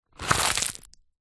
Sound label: foley cigarette packet squeeze crush